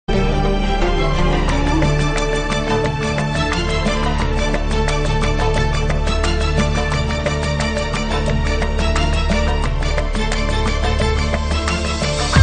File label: instrumental